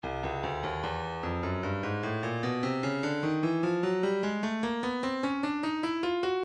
percussion midi files ride cymbals 150 superthin ride; Stylus